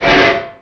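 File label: Collision Interior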